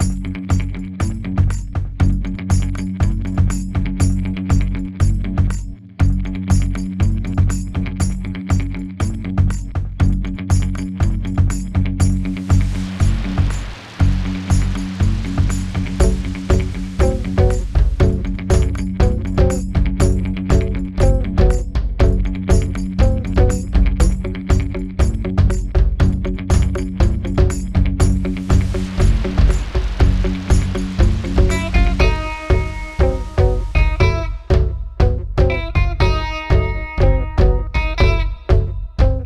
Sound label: Music Branch